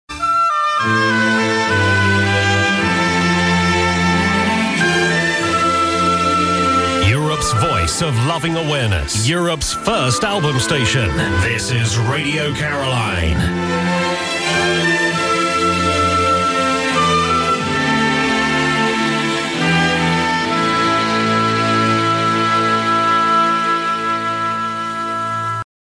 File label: caroline jingle